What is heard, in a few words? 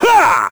melee; Crypt of the NecroDancer